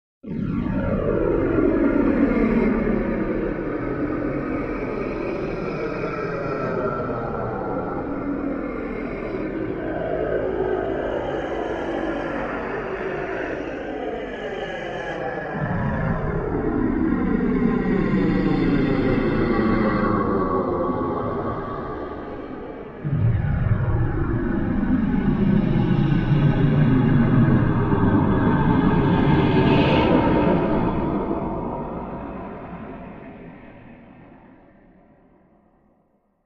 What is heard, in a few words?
Evil Spirits Rise; Low Breaths And Evil Growling Surges; Evil Spirits Rise, Version 3; As Lucifer Himself Arrives On The Scene